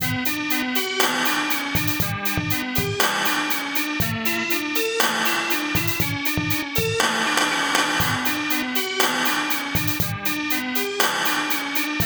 Single Sine Wave

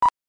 Sine Wave

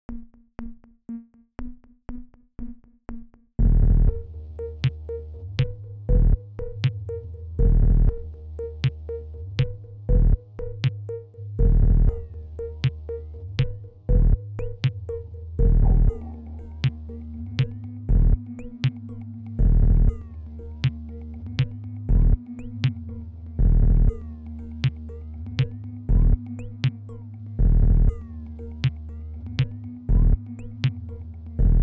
Sinewave

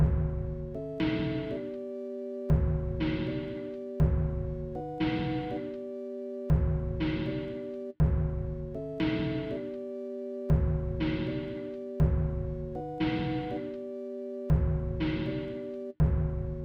Sinewave

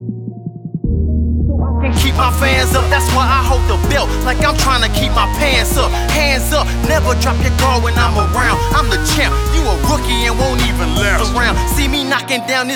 knocked out

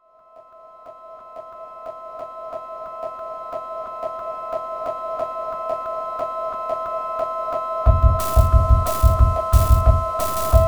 Sinewave

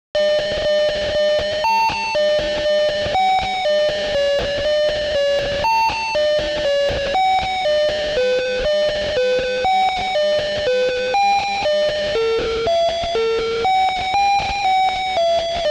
sinewave

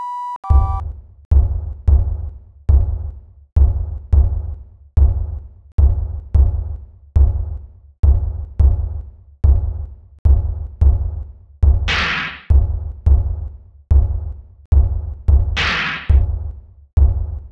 Sine Wave Project

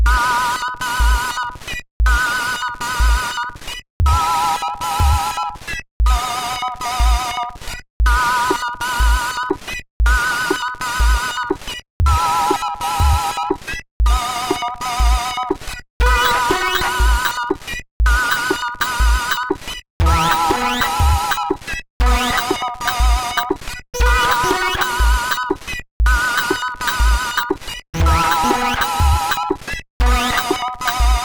sine wave

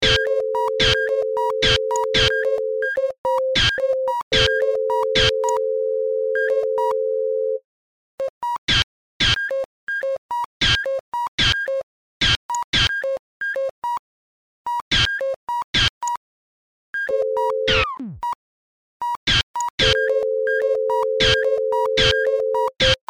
Sine Wave

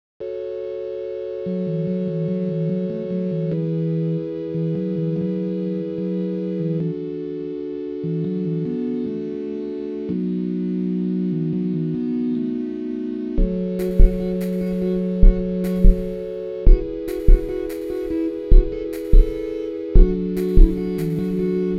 Sine Wave Project

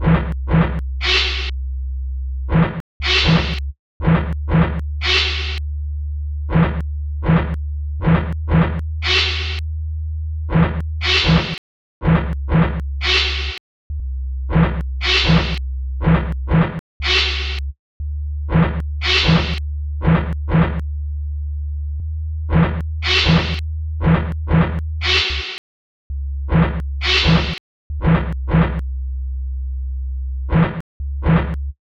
Single cycle Sine Wave

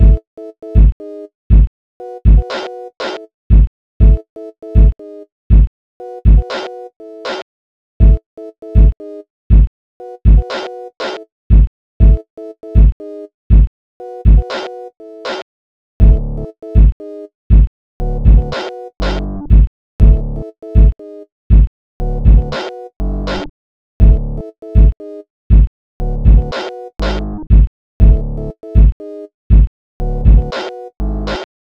SINEWAVE SONG

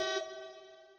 Sinewave Project

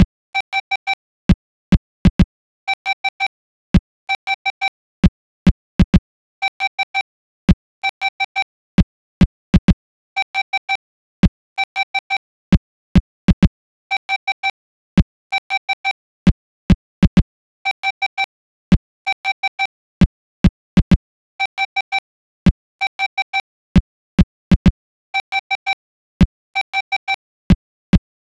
Sinewave

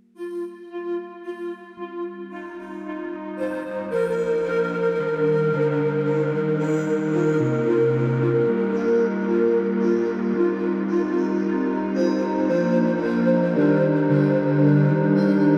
1khz sin wave, g minor 112 bpm

